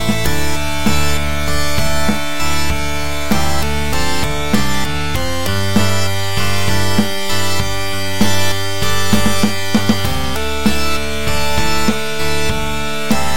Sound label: Overdrive Organ; Harmodion; Syntheway